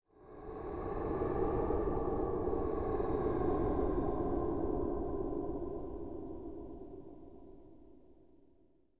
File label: BREATH OF DEATH